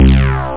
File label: big bass